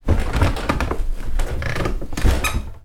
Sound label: household sideboard unit movement wooden